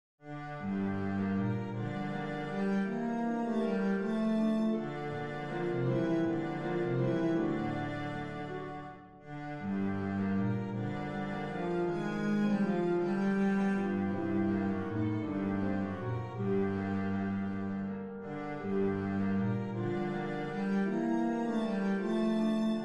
sicilienne, string bass

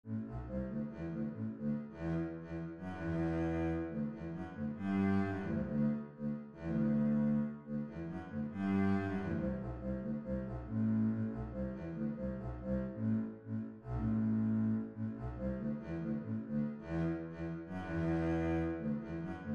braul bass, string bass

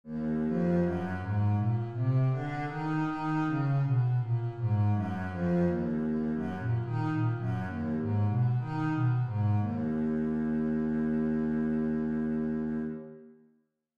bass E flat minor

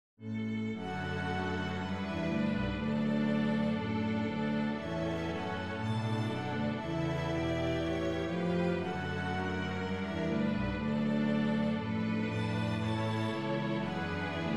concerto bminor, string bass

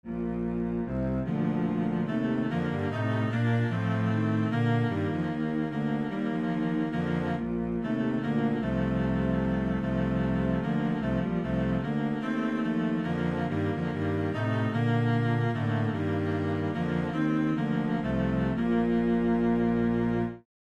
minuet, string bass

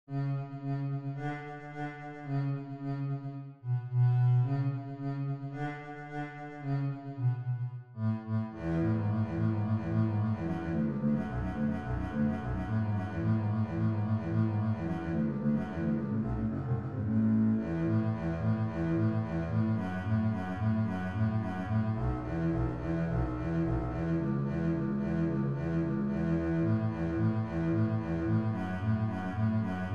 fiddle easy bass

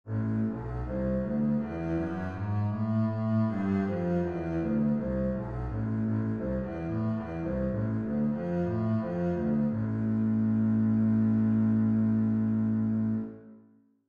bass A minor

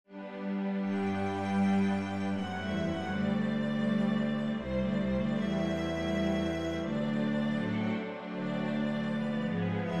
nocturne, string bass

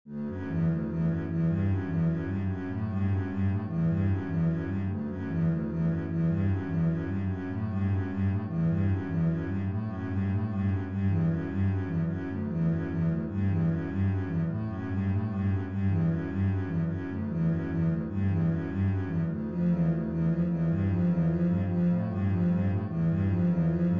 bass etude

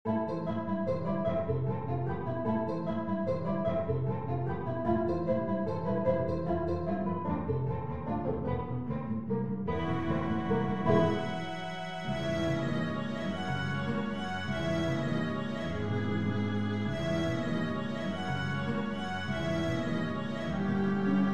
assez vif, string bass

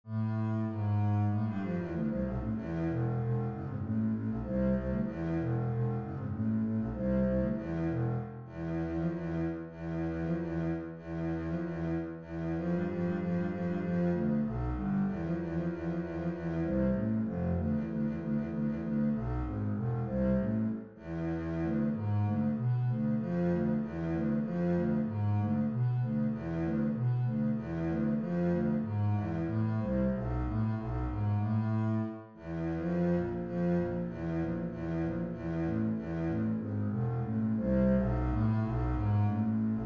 concerto a minor bass

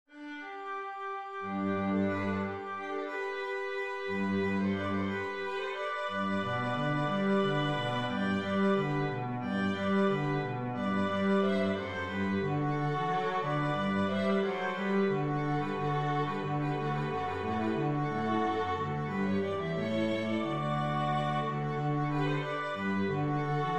rejouissance, string bass

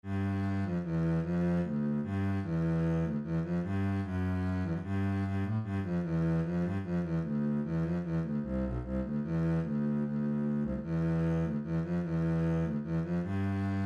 bass

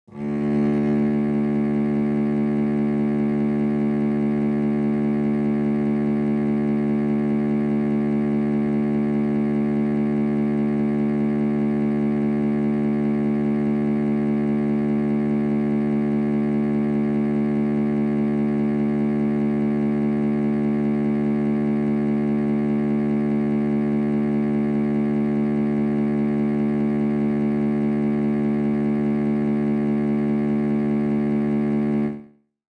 bass D

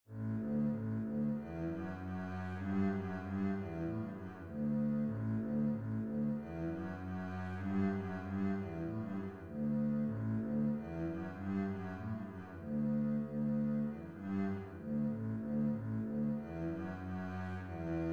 sara bass

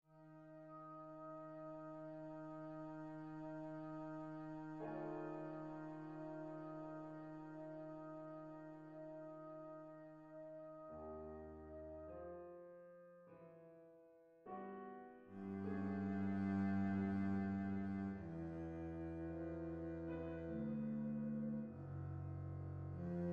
sehr langsam full

